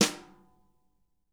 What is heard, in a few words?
BRSNR, drum